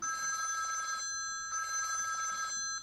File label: classic bell, ringtones